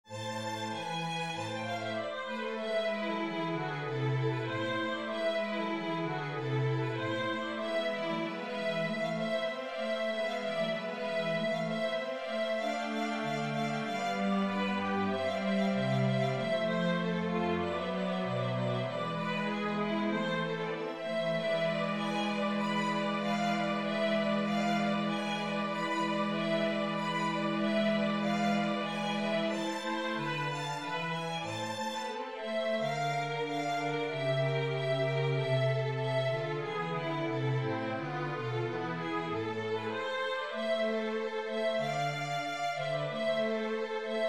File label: concerto a minor full